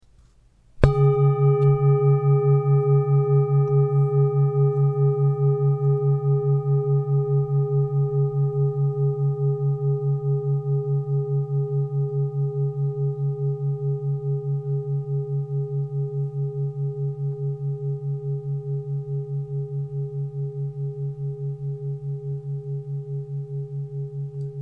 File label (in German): Klangschale; 1487g; Tivas